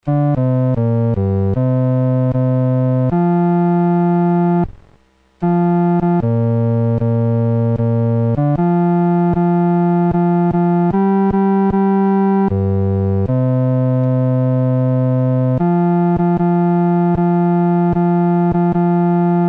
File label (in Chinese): 独奏 第四声